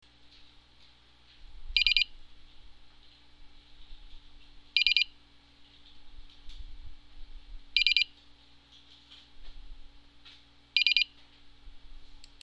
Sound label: pipipi pipipi pipipi